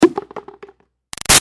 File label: Impact Cone Plastic Small Messy; Materials; Hollow; Big Room Sound